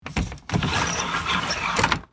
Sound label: Blind crank lower down squeaky rattle; blinds; gfxsounds